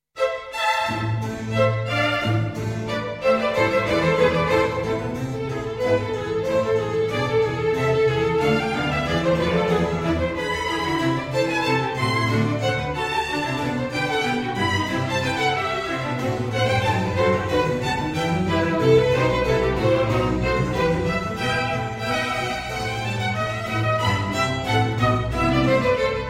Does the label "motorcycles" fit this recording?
No